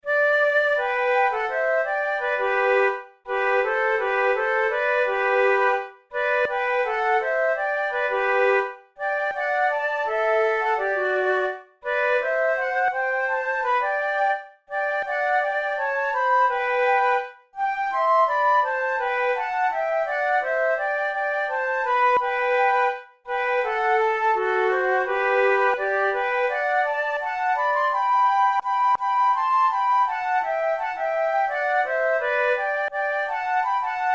duet, flute

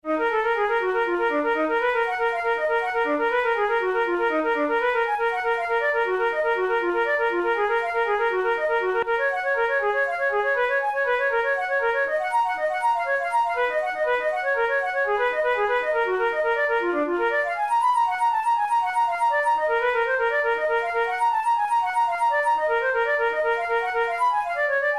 caprice etudes, flute

